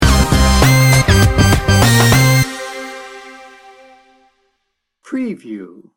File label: smooth and bouncy sting bumper